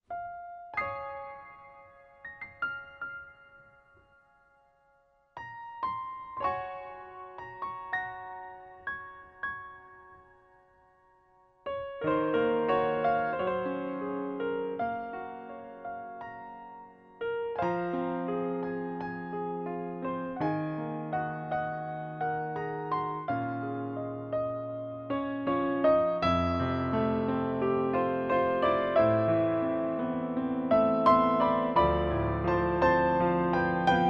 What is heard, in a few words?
Korg C720 Sound, zongorak